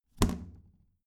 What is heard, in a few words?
plastic trash can close heavy